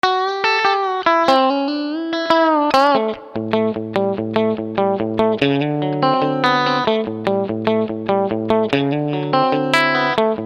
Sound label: Custom Tones Ethos Overdrive Classic Country Clean Direct